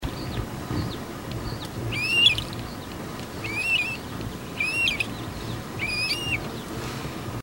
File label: Isabelline Wheatear Oenanthe isabellina; Ladakh